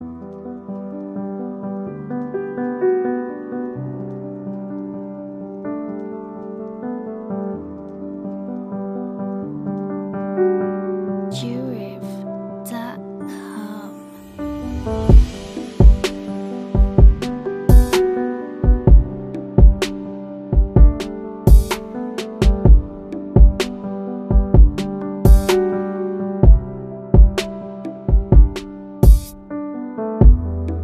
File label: RB LOVE PIANO INSTRUMENTAL BEAT